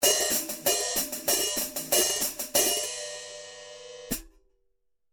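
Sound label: hi-hat, ZXT 14 Rock Hat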